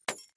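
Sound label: Glass Bullet Impact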